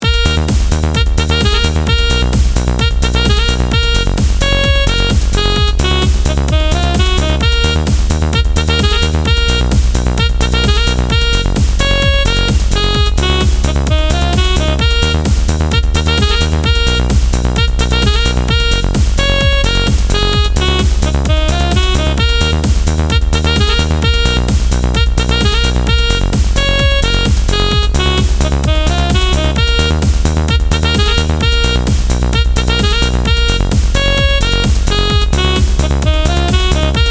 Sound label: remix